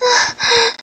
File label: Moan